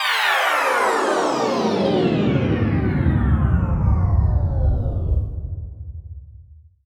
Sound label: Downlifter